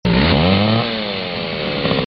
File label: engine; race